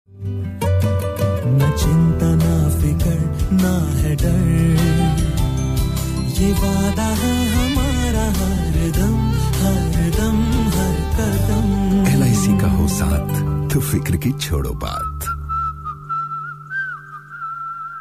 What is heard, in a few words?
Advertise Song